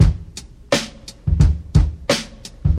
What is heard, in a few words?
86 bpm breakbeat c sharp key, kick tuned to the C# note